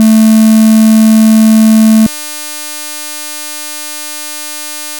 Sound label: wave triangular